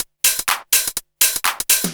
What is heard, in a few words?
124bpm, House Perc Loops; E Lab; XTc Files of House